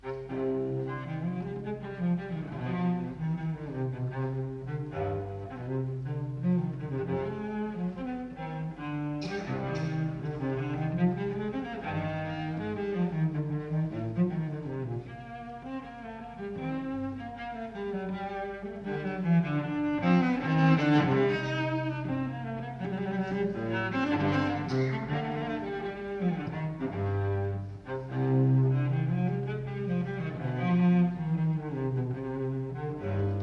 suite for cello solo no 5 in c minor courante; johann sebastian bach; bwv 1011

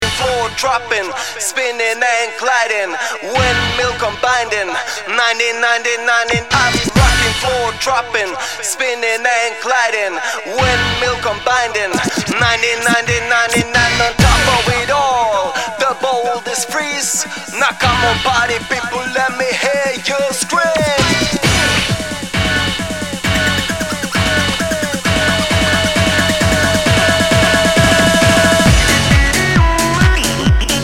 uprocking beats

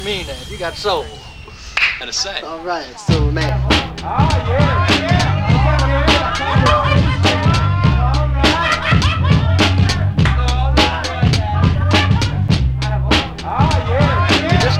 breakbeat

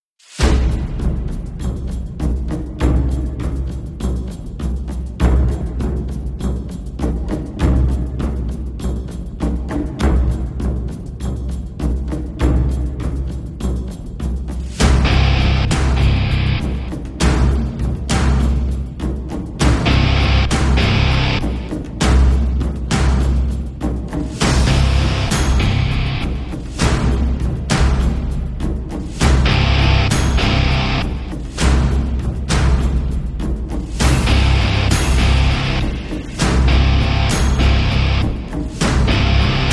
drm gtr

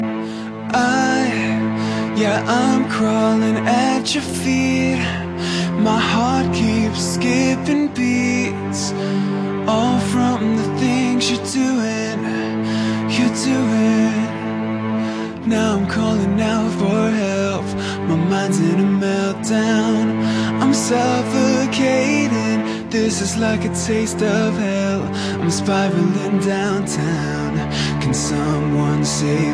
orchestral version

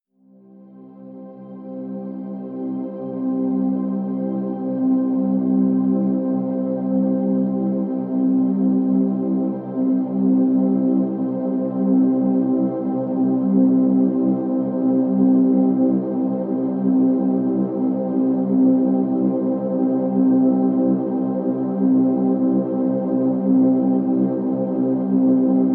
pads sublime c

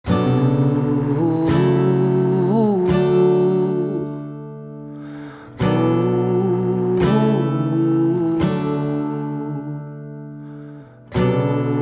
ocean acoustic

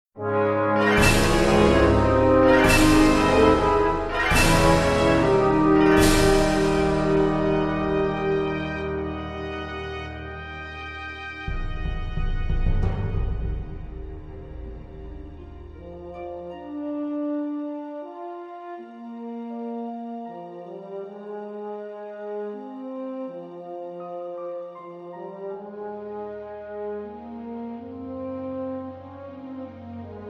metal instrumental

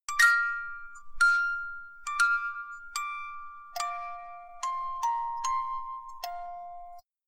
music box phrase

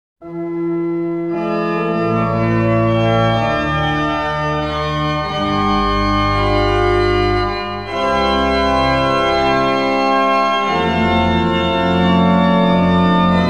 organ interlude

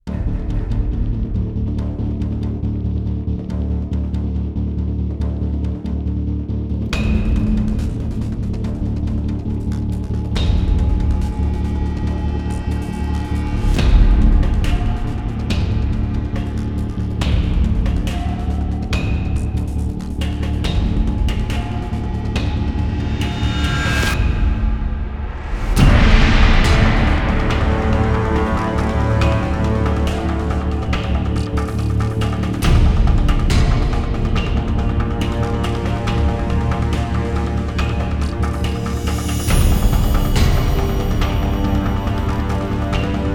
bass build

